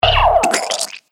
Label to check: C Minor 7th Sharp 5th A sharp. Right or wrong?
Wrong